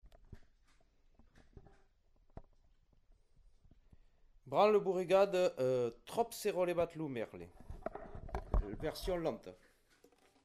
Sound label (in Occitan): trop s'era levat lo merle branle bourregade lent